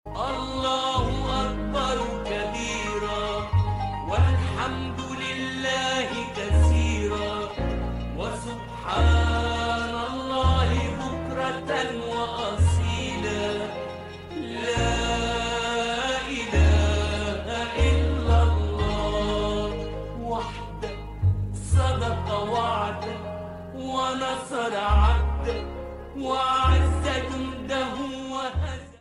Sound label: takbeer el eid ringtone